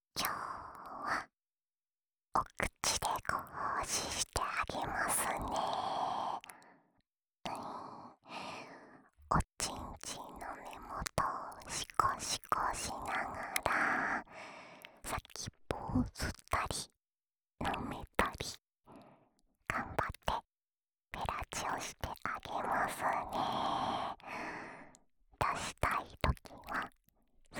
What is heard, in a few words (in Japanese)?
オナサポ用フェラチオループ, ASMR